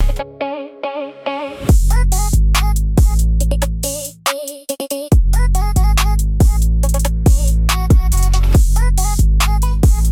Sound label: loop; bgm